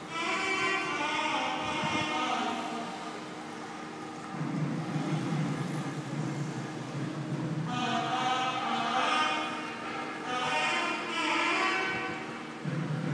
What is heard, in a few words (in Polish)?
uroczystość w hinduskiej świątyni w matale